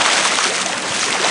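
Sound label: river skid; surfaces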